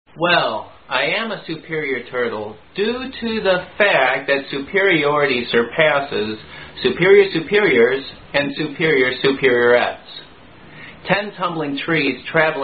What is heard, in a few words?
Are You A Turtle, Tongue Twister Drinking Game, Are You A Cardinal